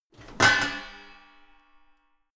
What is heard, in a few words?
garbage can open lid hit wall hard